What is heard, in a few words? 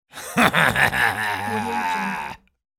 male bad man laugh